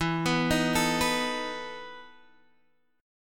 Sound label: E Major 7th Suspended 4th E down Guitar Standard; EM7sus4; arpeggiated; capo=2